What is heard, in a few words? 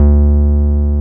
303 SUB D3F, SUB BASS